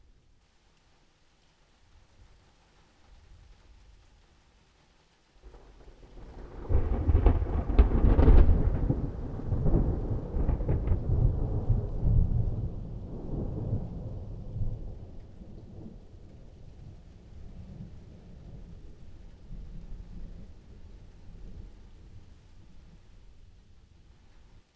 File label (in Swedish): Åska